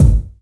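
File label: BD reverb, Yamaha RY-10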